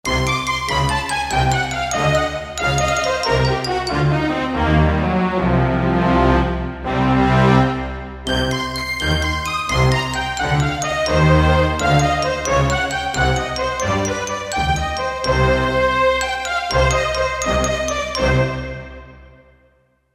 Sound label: Tutti Passage, Kurzweil K2000 Orchestral